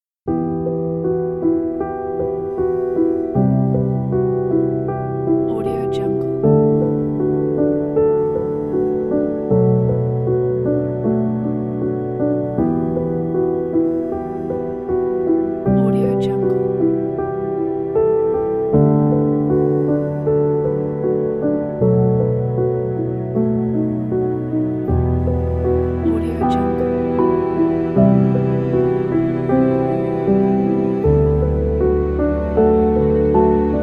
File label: the sad pianos